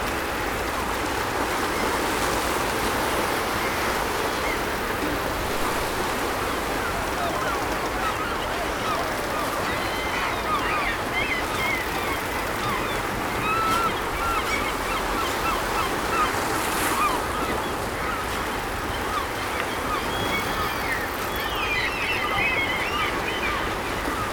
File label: docks, ambiance